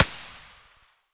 aps muzzle far; STALKER; IWP

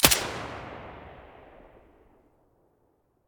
silencer stereo, weapons

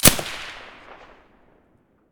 silencer dvl10 stereo

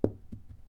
shell 39mm carpet; STALKER; IWP

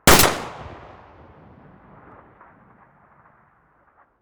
muzzle hpsa mono

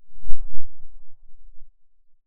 silencer dvl10 very far

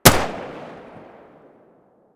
m1911 muzzle mono distant, weapons; colt

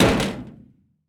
bolt metal, material; STALKER; IWP

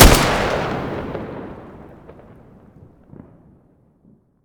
svd muzzle mono distant

